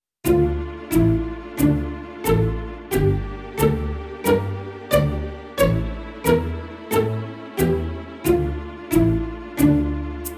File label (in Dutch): Langzaam en snel